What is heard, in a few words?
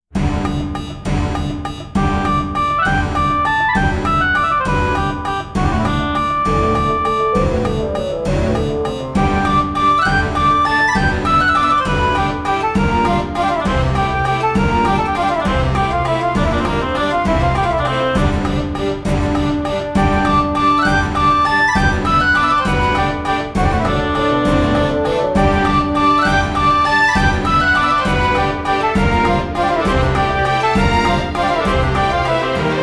town; 町の音楽B; 賑やか・行商・土着的